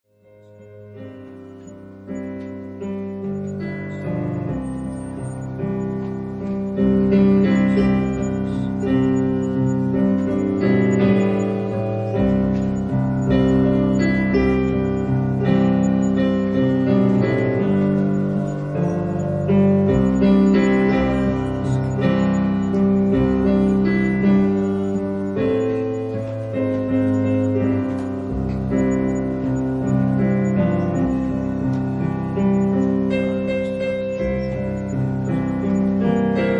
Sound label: Piano prelude